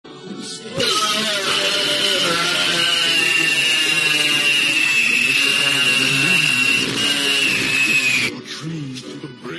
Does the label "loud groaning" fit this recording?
Yes